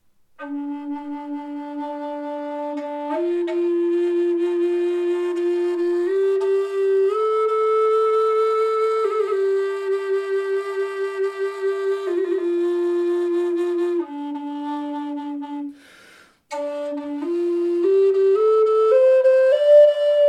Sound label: Native Flute D